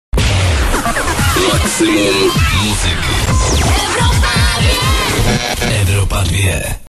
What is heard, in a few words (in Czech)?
jingl